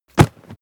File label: body fall generic mid tumble; krieg-waffen; nahkampf; soundpool